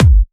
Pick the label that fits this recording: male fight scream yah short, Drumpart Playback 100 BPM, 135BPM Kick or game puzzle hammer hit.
135BPM Kick